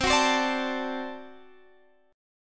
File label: D 7th, C